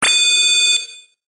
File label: laser gun